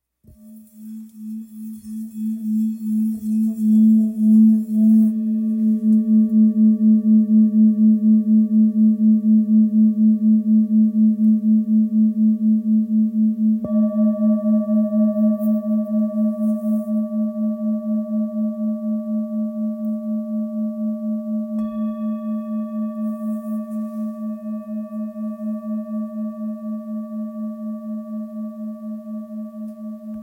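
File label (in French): Bol full moon la, bol chantant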